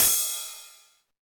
ambient cymbal drum sample g sharp key, Royality free cymbal sound tuned to the G# note